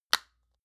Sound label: lotion large lid close hard; berufe; friseur